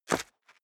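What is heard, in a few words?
step dress boots concrete grit single stair up, footsteps; schritte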